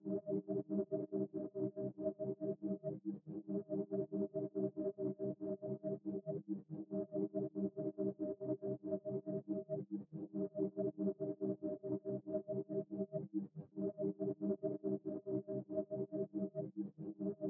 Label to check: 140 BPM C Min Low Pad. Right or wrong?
Right